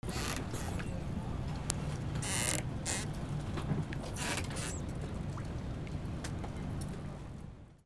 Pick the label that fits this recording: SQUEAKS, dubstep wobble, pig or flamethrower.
SQUEAKS